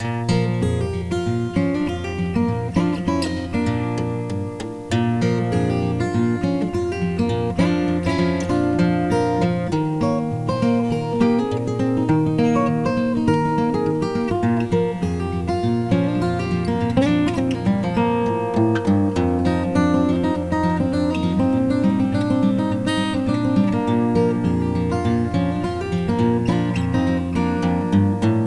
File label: Cabin Guitar Tune